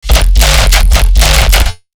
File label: Eb CRUNCHY BASS